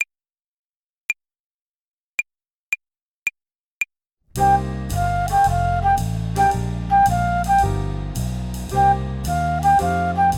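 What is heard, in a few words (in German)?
Swing Phrasierung Übung; play along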